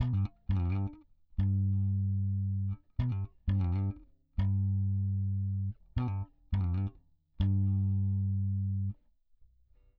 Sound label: bass